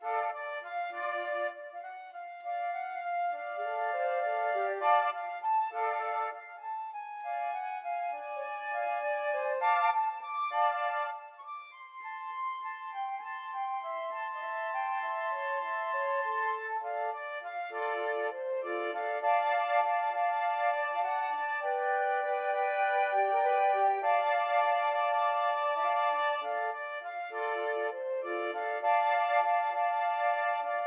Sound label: flute trio